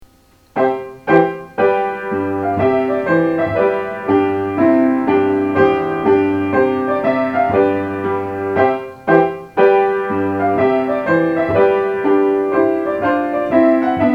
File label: Trumpet Tune Piano; Purcell